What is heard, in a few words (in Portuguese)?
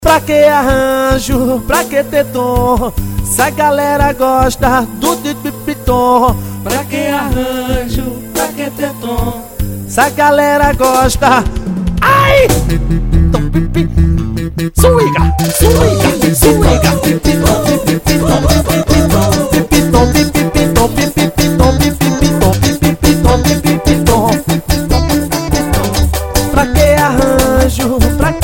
pancadao eletrico swing